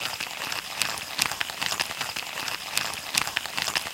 Reeling, fishing; Ultimate Fishing Simulator 3 Demo

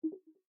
popup sound; UltimateFishing2020

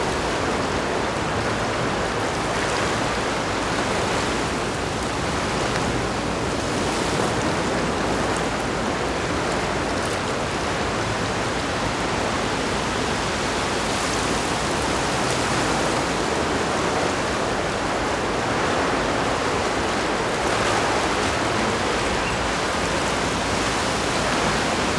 Shoreline; UltimateFishing2020

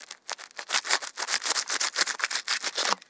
Sawing Flesh; UltimateFishing

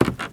STEPS Wood, Creaky, Walk

